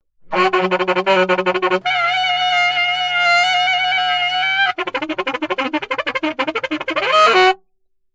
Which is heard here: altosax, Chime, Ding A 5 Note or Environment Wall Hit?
altosax